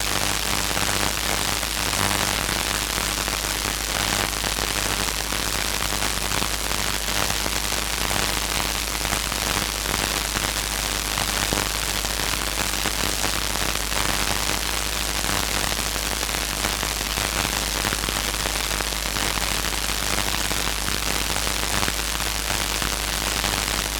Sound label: glitched tones AM Radio Interference